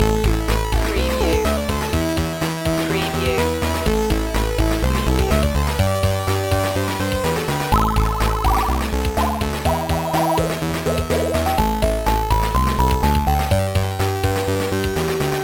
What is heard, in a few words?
retro video game hotfix loop